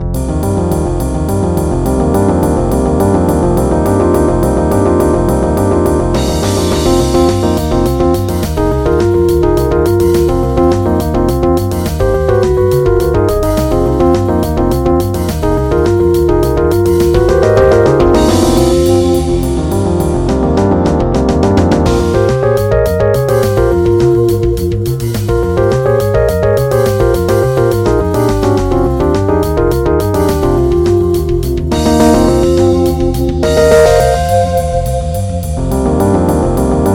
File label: Underwater